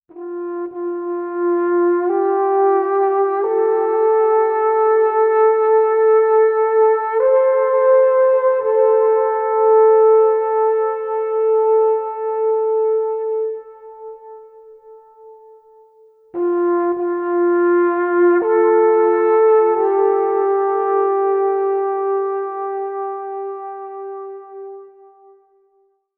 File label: Alphorn Solo